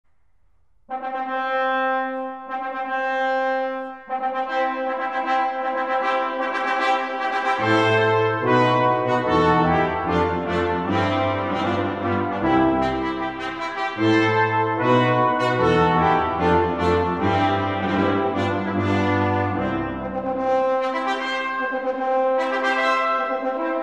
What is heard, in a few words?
Quintet Wedding March; Mendelssohn